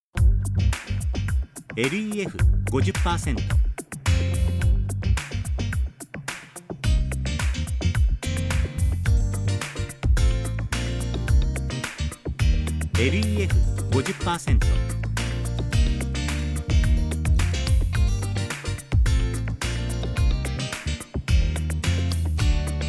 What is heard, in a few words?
m fusion